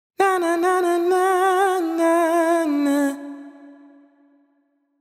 Euphoria Ad Lib Wet - 140 BPM F# Min, Vocal Sample Pack; Cymatics; Ad Libs